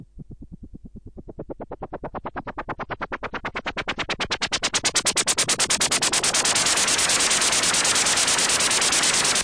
noise SH09, noise sweeps

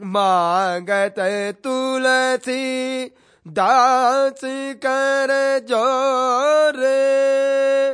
male devotional, Indian Singing

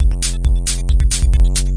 135 hats n bass n kiks, drum loops and breaks

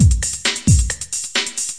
134 bad electro, DRUM LOOPS and BREAKS

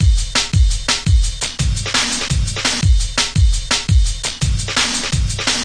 170 split ends, DRUM LOOPS and BREAKS

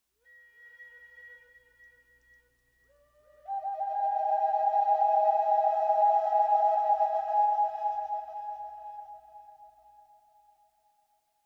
verby bamboo flute; Flutes

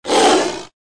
roar

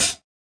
hat

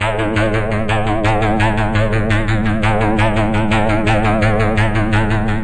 170 synthetic jawharp, synth and electronic loops

